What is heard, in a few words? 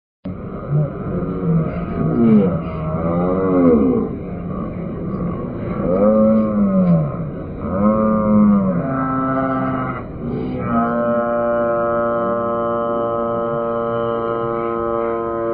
pizz